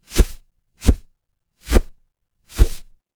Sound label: transition swoosh